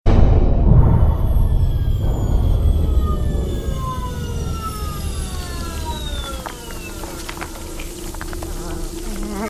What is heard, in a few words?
big engine powering down; car